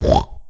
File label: piglette oink story